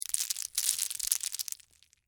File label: Debris Crackers, Oyster; Texture; Debris Sound Effects; Materials; Blast Wave